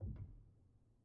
Pedal On, Upright Piano, Knight; Chordophones

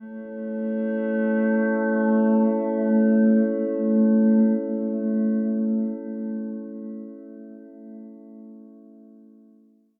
ambi glass hum

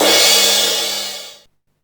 Crash, Sequential Circuits Drumtraks; drum machines

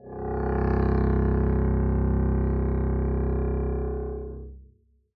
arco c1 f down, double bass; dsmolken

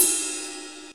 drum cymbal soft

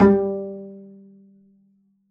pizz g3, double bass; dsmolken

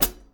Hat Pedal Hardest; hydrogen drum samples

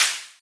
hat noiz

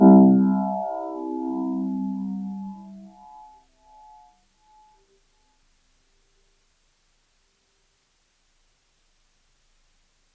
Grand Piano, Kawai, Sustains, C1; Chordophones; Legacy

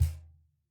Cajon hit p; Idiophones; Struck Idiophones

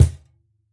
Cajon hit f; Idiophones; Struck Idiophones

